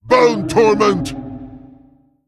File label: Male Vrykul Attack